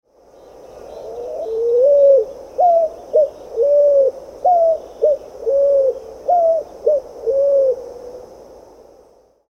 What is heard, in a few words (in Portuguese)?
pomba asa branca